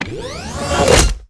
gun up; Monster Hunt 2 Lite

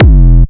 pick

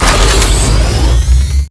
Large Health Pickup; Monster Hunt

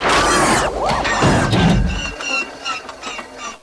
Death

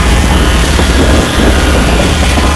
Pulse Rifle

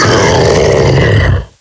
Pound Death

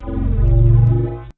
Regen Hiss

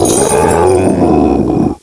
Deer Death

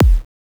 Sniper Fire; TheDanes UT Projects; AMLPFunRifle

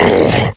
Fear; Jack Frost

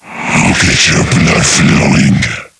Scrake Threaten

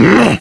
Gore Attack, Ginger

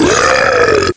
Deer Attack

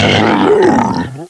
Jack Death; Jack Frost

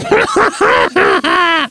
Gore Roar

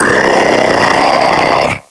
Roar; KF Christmas; Patriarch

